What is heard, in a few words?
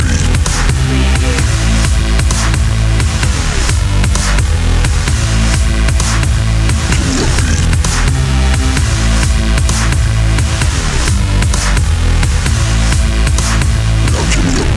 gimme a beat loop